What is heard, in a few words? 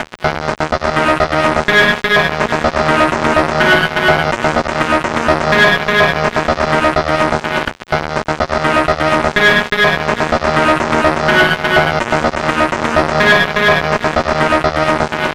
Sequence, Drums & Drones